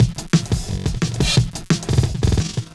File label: Glitched Beat 175BPM; glitch kit